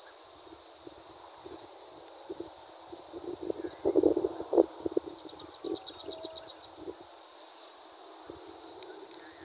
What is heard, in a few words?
Lesser Whitethroat; Mobberley; the unmistakable rattle of a Lesser Whitethroat; Merlin